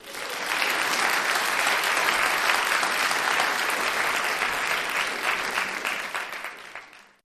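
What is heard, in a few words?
Applause Small Crowd Indoor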